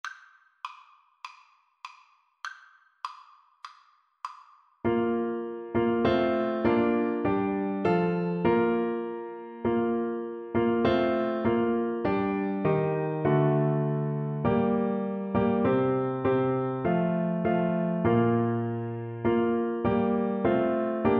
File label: horn, French Horn